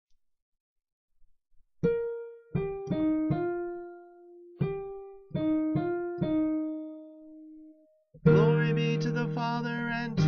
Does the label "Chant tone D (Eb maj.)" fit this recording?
Yes